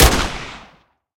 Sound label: Thug H Gun; netent; narcos